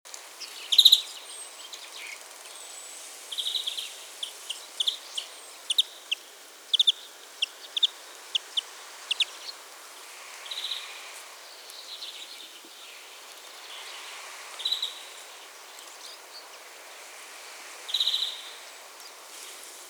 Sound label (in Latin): Chloris chloris; SETE